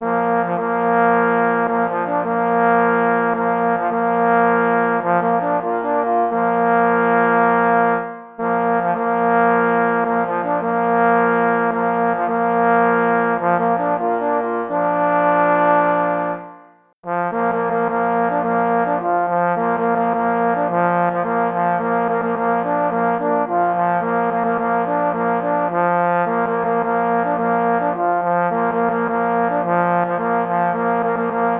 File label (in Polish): Sygnal na 3 parforsy; M. Kalka; Polski spaniel mysliwski; pies w muzyce lowieckiej